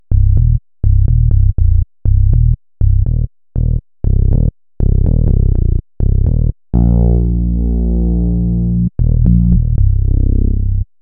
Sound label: Simple Bass; PROTON3 refill